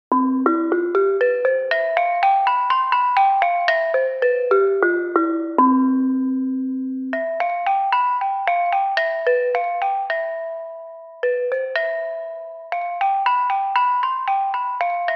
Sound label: scale gamelan